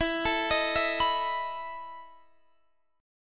E Major 7th Suspended 4th E down Guitar DADGAD

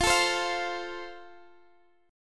F Major Guitar Open G; down; chord shape

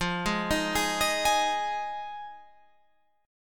F Minor 7th F Guitar Open F; arpeggiated

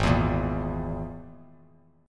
G sharp Suspended 2nd Sharp 5th, Bass Standard; strummed; 4,7,8,x

